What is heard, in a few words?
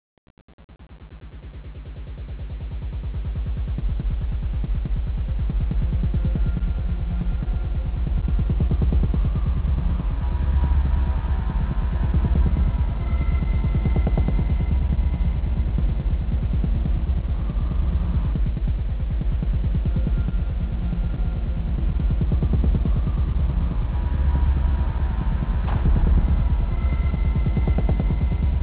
song; aompa